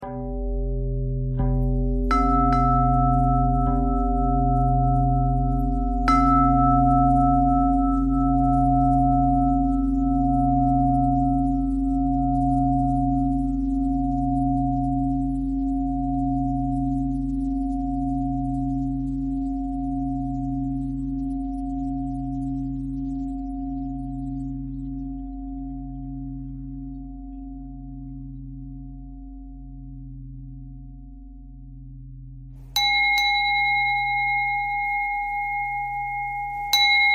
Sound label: bell bell thread simple, bells